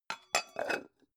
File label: pan close